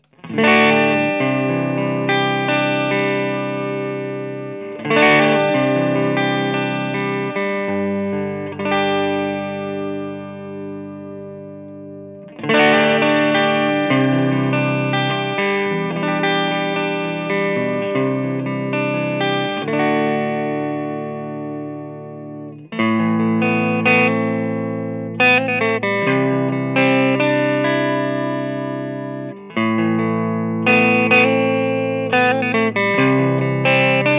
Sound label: 18watt pp tele